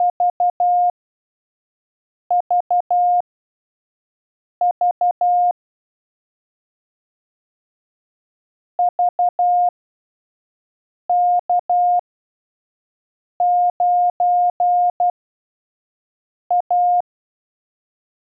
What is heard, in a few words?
5WPM test